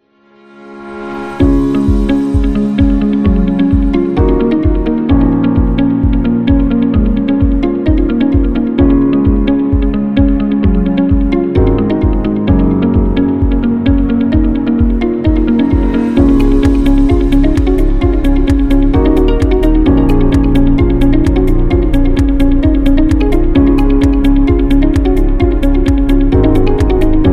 Educational Corporate; medical background

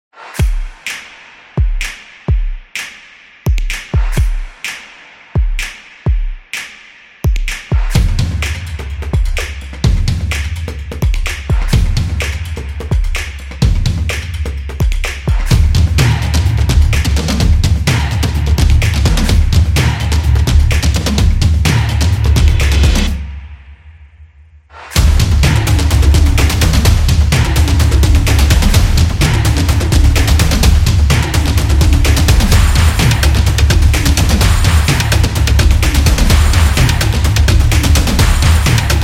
Beat; high energy

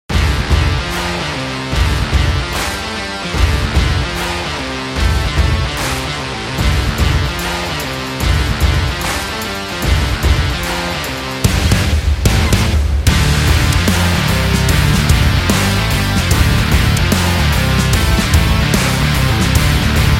Indie Rock Sport

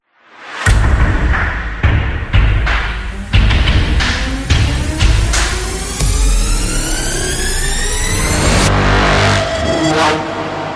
Epic Opening; battle drum

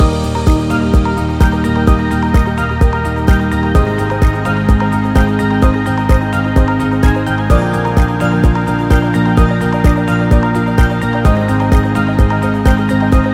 Upbeat Loop Corporate; explainer

